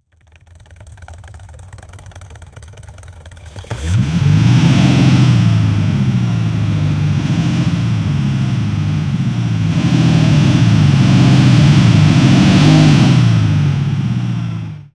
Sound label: amg 4.0l v8, automobiles; ls2 m177